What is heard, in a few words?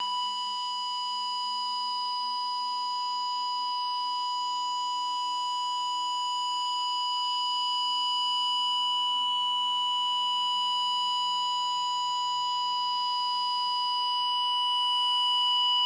Sinewave